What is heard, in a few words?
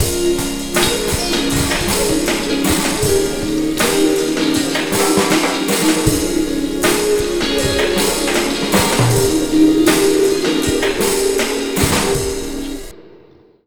Slo Mo Full Wak-A#, Slo Mo Funk Mixes, Lounge; Zero-G Kocktail Kollection; Construction Kitz (Lounge)